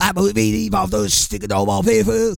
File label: REGGAE, Vocal Planet World Voices